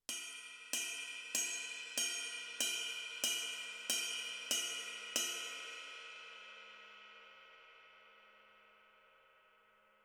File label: 22 RIDE BELL, cymbals